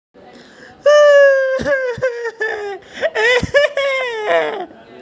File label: cry out loud